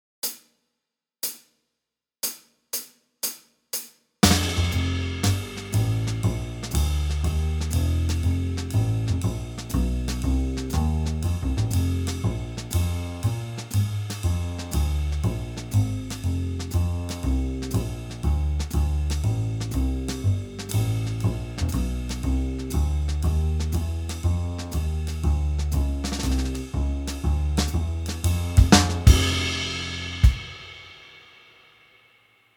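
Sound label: piano guitar blues 120; Etude 1 Backing Track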